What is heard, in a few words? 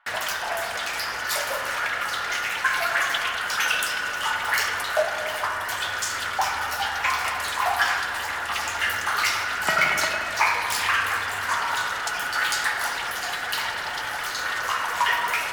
Exterior Manhole Cover Loop; Warframe; Ambience; Nineteen Ninety Nine